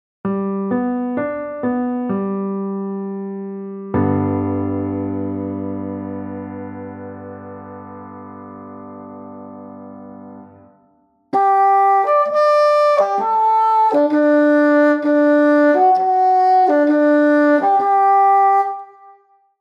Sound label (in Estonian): Fagott; g-duur